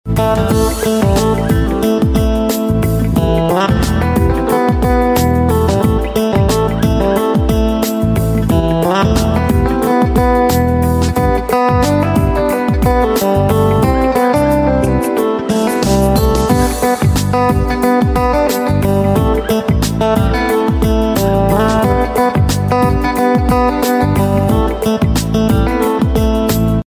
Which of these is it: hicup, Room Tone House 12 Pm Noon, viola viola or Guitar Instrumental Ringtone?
Guitar Instrumental Ringtone